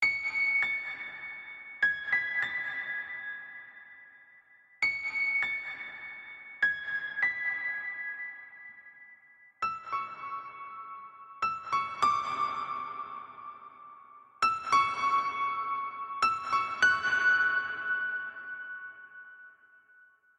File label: Fill